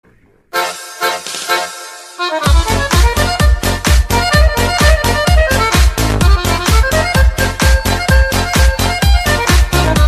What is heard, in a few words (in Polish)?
polka, dzwonki na telefon